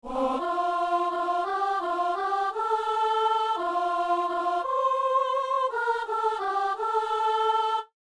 psaume, soprano; Psaume 70 (71); Messe du 17 janvier à Saint-Denis

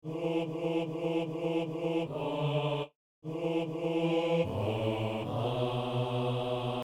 psaume hommes; Psaume 31 (32); Messe du 17 janvier à Saint-Denis

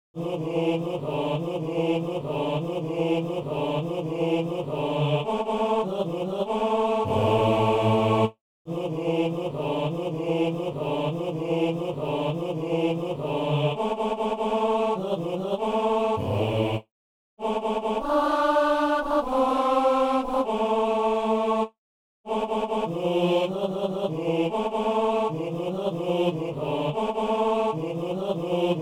hommes; Ah ! Que nos pères étaient heureux

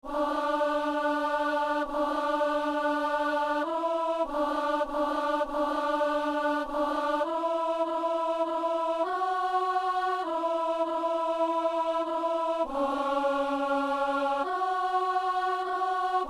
agnus, alto; Messe de Saint-Boniface

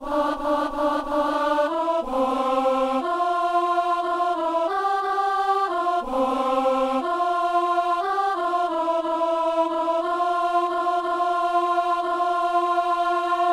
messe simple gloria alto

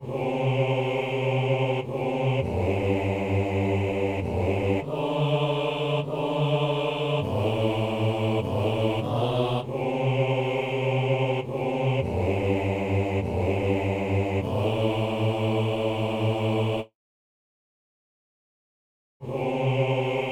basse

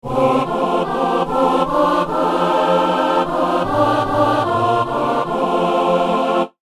à tes enfants seigneur accorde ton amour

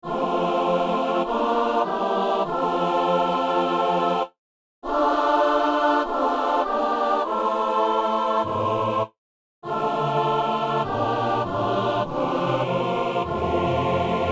quatre voix; Dieu est amour; Alto (4 voix) / Ténor (4 voix) / Basse (4 voix)

